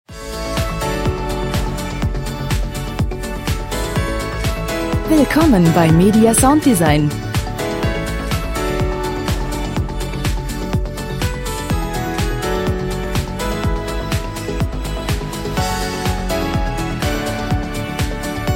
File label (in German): pop schlagerqueen